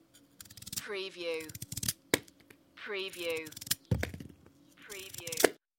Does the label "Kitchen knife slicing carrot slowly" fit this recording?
Yes